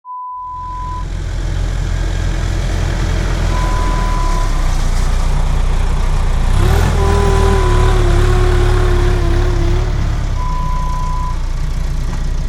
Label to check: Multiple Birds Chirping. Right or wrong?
Wrong